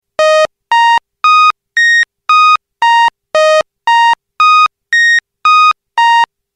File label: nts1 saw